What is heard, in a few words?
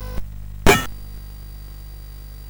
sid CSG8580R5; Summix